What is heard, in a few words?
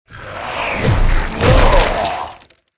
snow santa attacks, monster